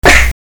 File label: Punch